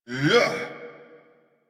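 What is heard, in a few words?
Ability Exert; Dialog; en; Warframe; Veilbreaker; Kahl Barks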